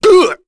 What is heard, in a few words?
Vox Attack; Phillop; kingsraid